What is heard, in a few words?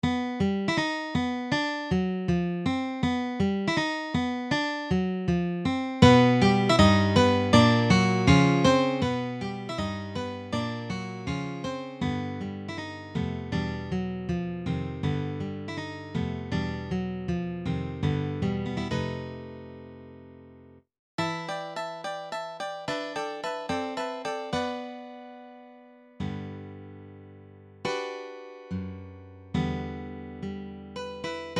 Fanfare